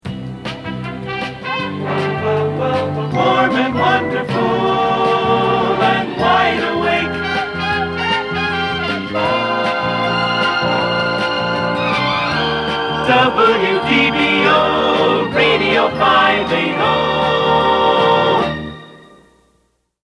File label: jingle; WDBO; Warm and Wonderful